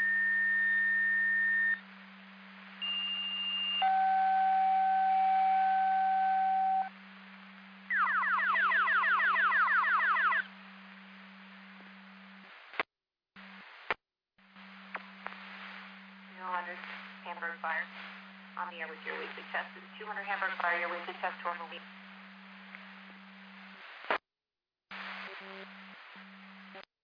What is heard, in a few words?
Siren; Hamburg FD